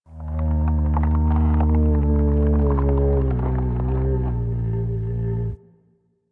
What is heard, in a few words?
Landscape Mushroom Creak; One Offs; Warframe; Venus Open World